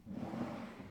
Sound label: close panel, exchanger